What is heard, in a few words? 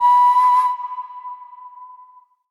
Flute Alt B; Player Instrument